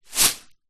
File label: rice dry in plastic container tip movement; zapsplat